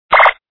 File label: laser